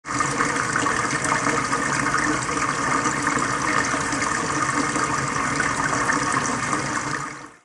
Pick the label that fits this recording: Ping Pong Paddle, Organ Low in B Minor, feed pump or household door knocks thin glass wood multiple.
feed pump